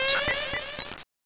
Beam; bishoujo senshi sailor moon r snes

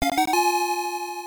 Coin; Hyper Sports; arcade

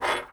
Metal Drag start; in-game sound effects; Papers Please; android ios windows gamerip 2013

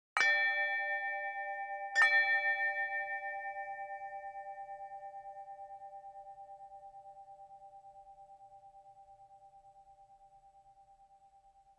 Chimes

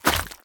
Scenario Gate Gore Hit

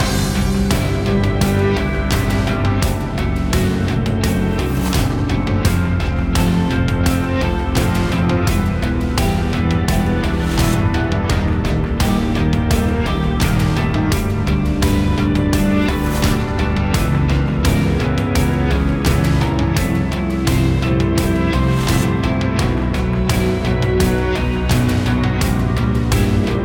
BGM Kowloon Street

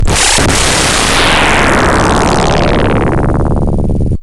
Explosion; Star Cruiser II; PC-98

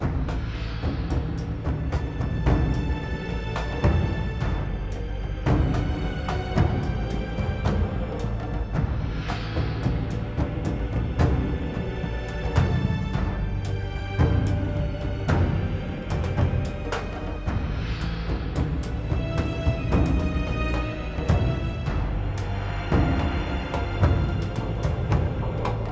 Creep Medium

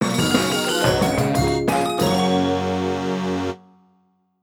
Jingle, Success; Candle Bear

